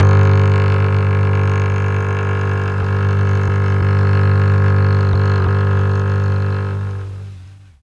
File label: G1 Mellotron cello; Mike Pinder - Mellotron Archive CD